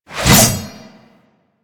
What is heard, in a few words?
Pole Arm Charge Whoosh; Warframe